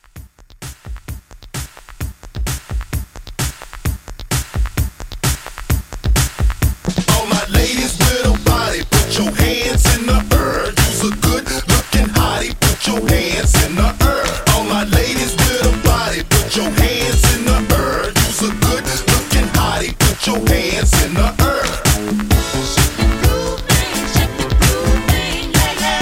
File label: 70s Disco Hype Re Drum 130